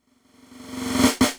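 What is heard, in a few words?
drums, Breakdance 172 B minor; Big Fish Audio; Hardcore Breakbeat Action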